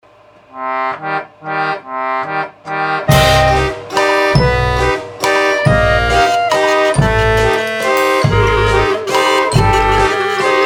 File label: instrumental; hawker news